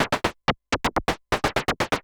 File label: SH101 Clocked LFO Rhythm (125BPM); noise hiss crackle